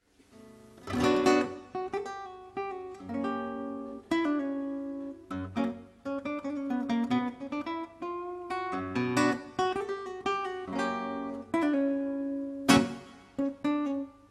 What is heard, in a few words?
tango